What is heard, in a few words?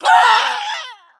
Female Beast Void Elf Death